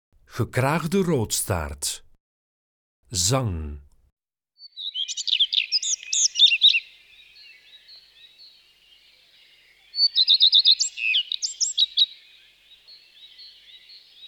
Gekraagde roodstaart, Zangvogels; IVN

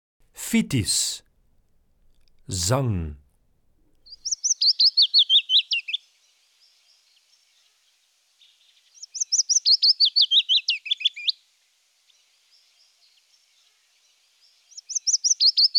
Fitis, Zangvogels; IVN

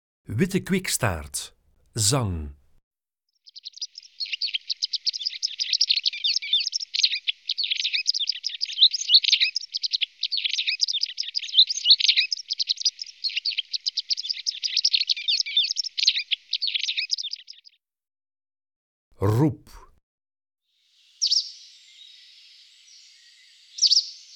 Witte kwikstaart, Zangvogels; IVN

